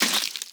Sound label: bite hit